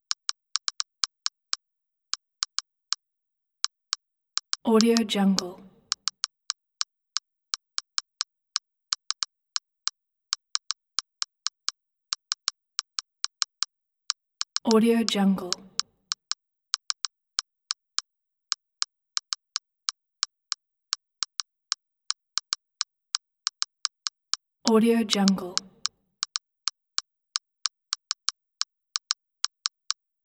phone typing text message

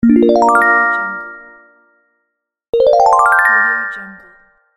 fairy

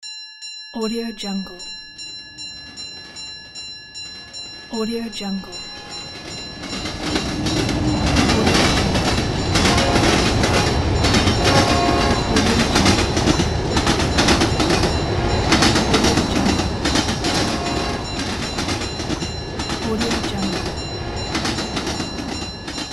train passing through station

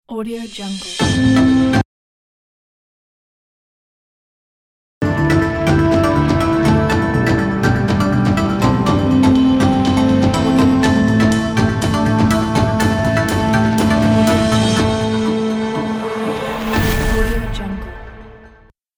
news logo

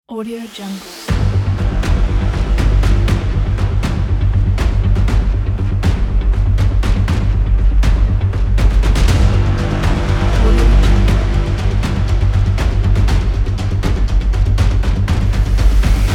pursuit

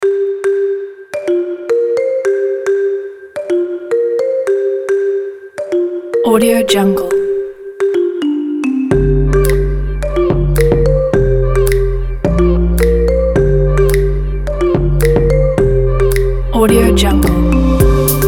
inspirational summer travel vlog

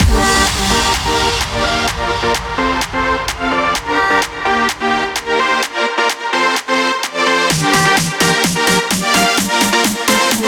edm

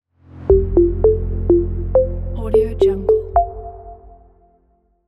minimal logo

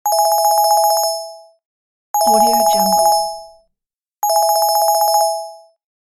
phone ringtone

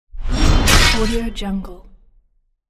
melee weapon hit; infinity-sfx-productions

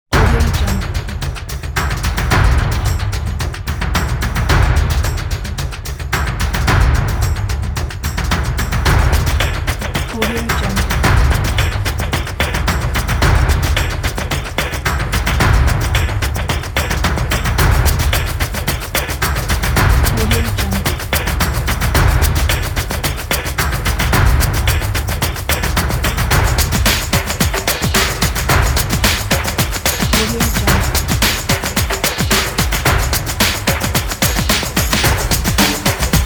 fashionable drums

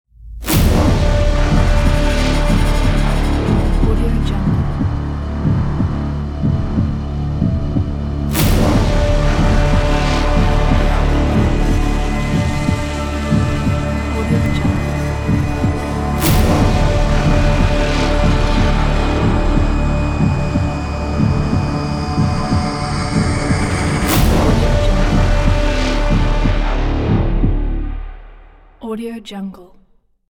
horror movie ident

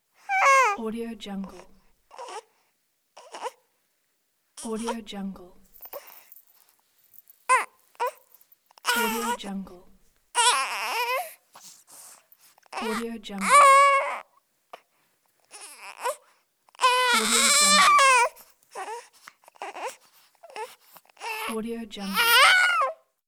fussy newborn baby

